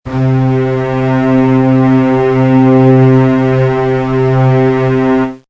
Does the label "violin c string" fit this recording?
Yes